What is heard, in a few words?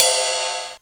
R50 Ride, Kawai Drums